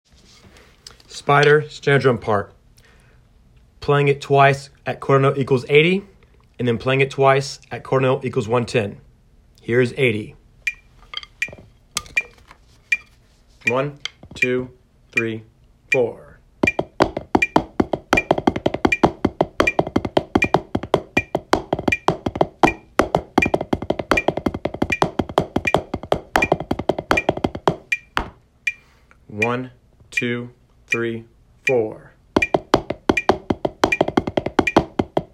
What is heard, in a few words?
spider snare drum